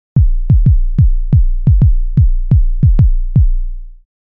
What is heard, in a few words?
bassdrum; opx pro3